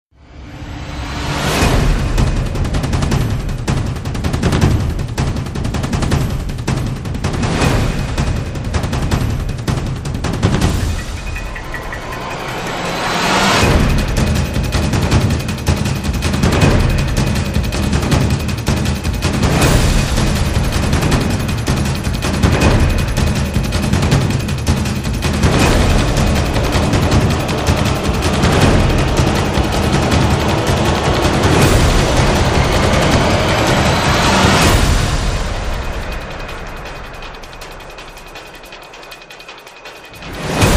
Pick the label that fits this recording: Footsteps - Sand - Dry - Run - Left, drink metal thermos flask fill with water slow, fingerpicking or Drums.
Drums